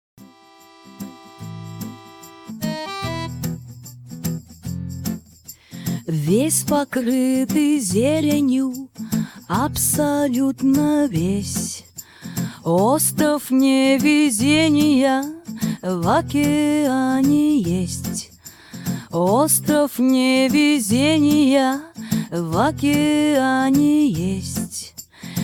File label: Riff verse